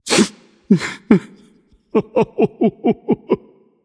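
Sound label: boohoo, non-verbal; voicepack; smite; merlin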